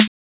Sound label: Snare, techno drum